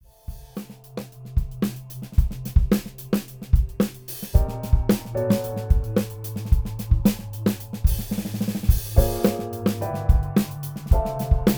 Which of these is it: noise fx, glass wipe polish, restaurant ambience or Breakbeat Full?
Breakbeat Full